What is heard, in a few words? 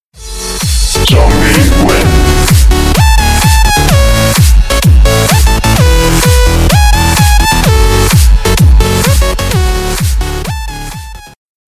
zombies wins